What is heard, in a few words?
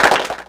Clapbox Pitch6; Ableton Foundation FreePacks